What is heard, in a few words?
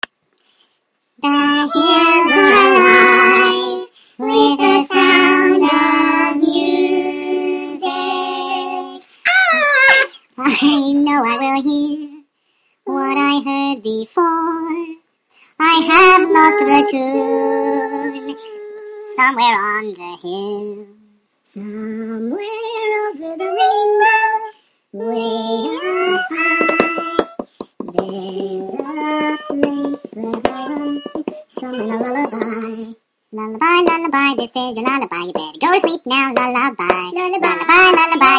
lullaby